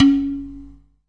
Big pot hand, Clay Pots, ethnic and world percussion